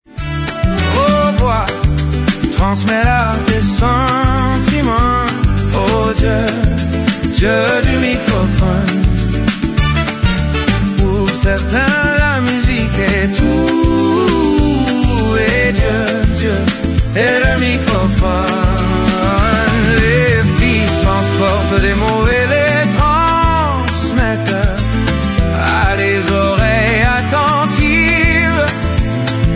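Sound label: Havana Funk; Latin Pop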